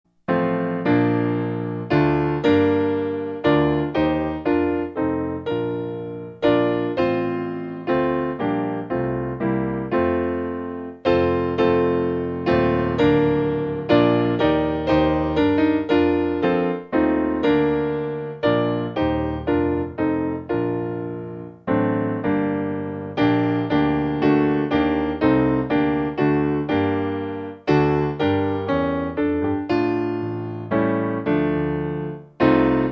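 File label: Piano, psalms of david in metre; St Matthew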